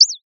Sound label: Sound of a bird tweeting nicely